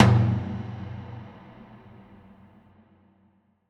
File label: Percussion Hit; One-Shots; Ghosthack; SkyRealm; Percussion Hits